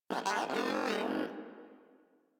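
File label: Moa Aggressive Vocal Bored Mock Sleep; Warframe; Pets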